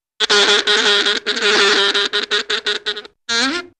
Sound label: fly buzz, insekter